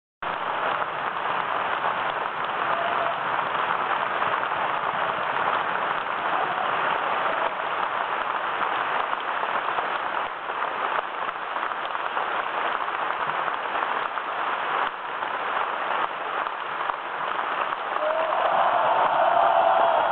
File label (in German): applaus; Lohengrin; Oktober